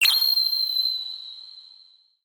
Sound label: eye shine